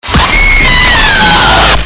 glitch attack

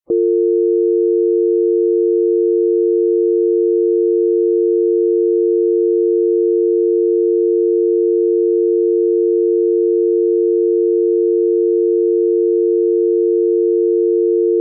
dial tone uk

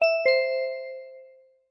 ddingdong

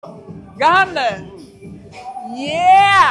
yeehhhh

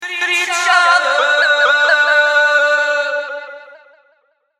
eeeooootttthheerrr

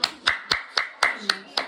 gorilla

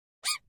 meow

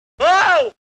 goofy screams

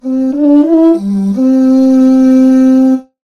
weird music meme

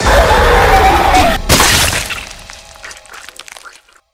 cartoon cat attack

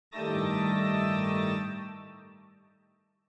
wrong note

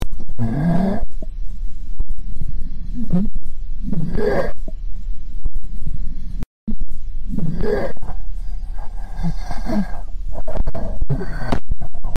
breath

